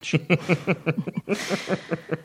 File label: quiet chuckle